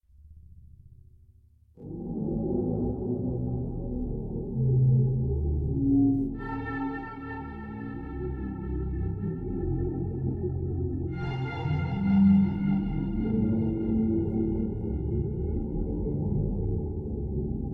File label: glow atmospheric background